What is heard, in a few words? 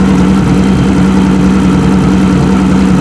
vehicle zodiac idle